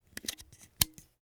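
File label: electric shaver close up switch clicks on off close up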